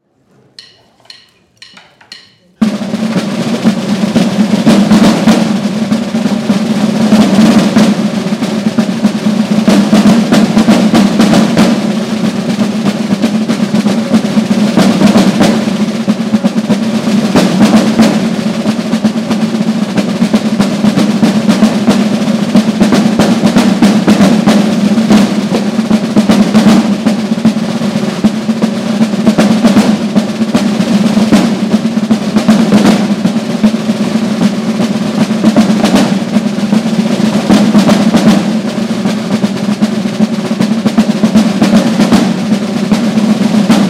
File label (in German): Tambouren Schweizer und Franzosen Tagwach